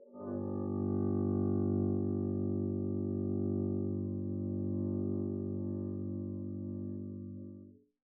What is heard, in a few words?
arco g1 p down, double bass